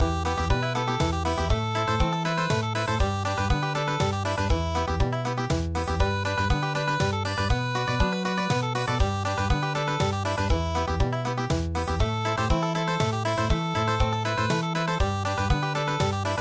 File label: chonan samugori Country